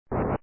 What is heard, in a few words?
fire; phantoon